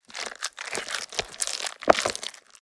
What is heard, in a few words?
Stirring cake mixture cake batter